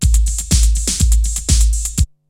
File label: LOOPS, TECHNO; Syntec; Wall of Sounds; TECHNO-BOX